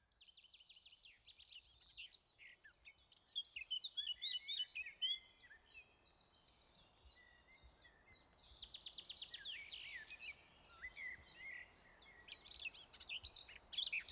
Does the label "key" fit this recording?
No